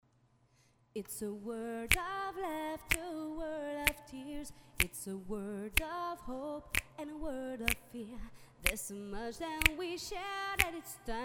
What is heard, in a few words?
acapella